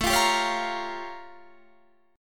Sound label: A Augmented 7th Flat 9th A; A+7b9 strummed; 1,3,sharp5,b7,b9